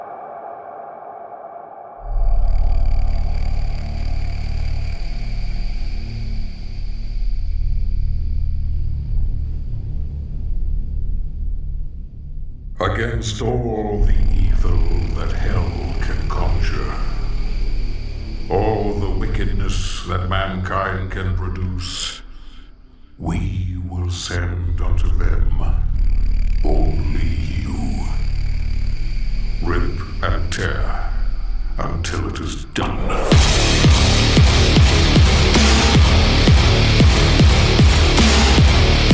music hell on earth; Doom Eternal

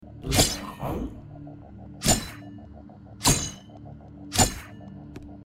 sword hit; risk of rain 2

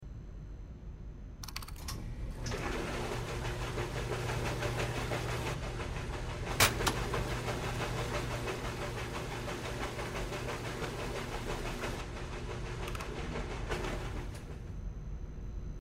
washing maschine; life is strange

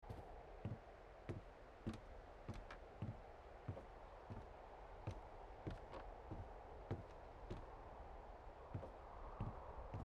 player walking outpost; firewatch

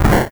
fire explosion; duck game

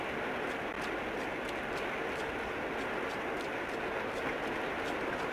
wet ground; Limbo; perception; gamesoundopedia

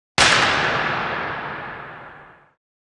echo indoor hall; it takes two

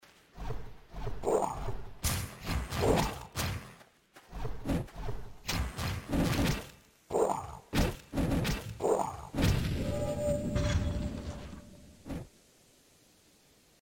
getting hit death; hyper light drifter

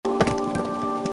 stones falling; Unravel Two

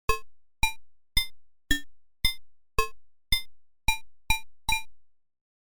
knocking on glass; Little Computer People; gamesoundopedia

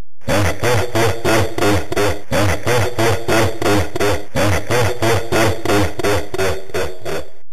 loadingscreen laughing; kung fu master

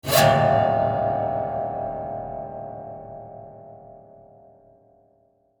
player detected screechy; dishonored

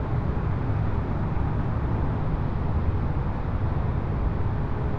amb loop cargo lift

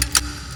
rotate combination lock; We Were Here Forever

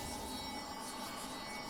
powerup sound; hollow knight

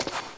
step; Metal Gear Solid; snake; gamesoundopedia

